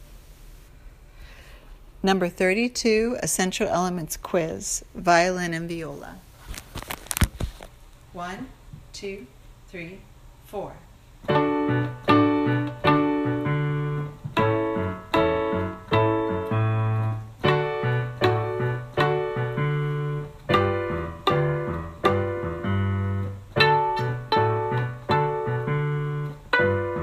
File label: violin viola